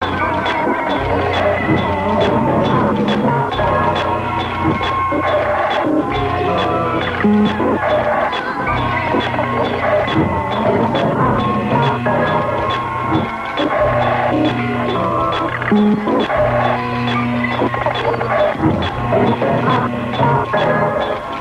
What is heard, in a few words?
loop orch